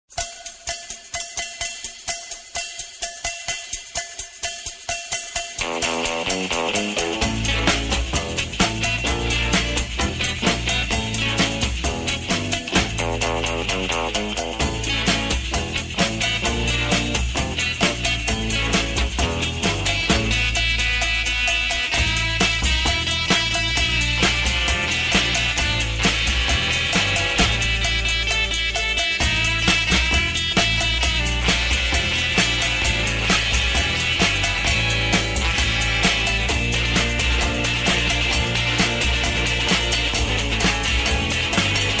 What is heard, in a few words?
guitar cha cha; Rock’n’Roll